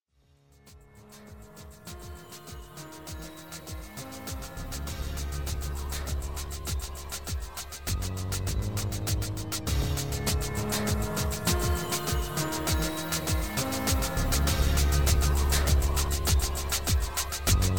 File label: pizz dance